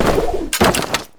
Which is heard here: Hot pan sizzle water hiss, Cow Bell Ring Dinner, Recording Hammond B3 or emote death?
emote death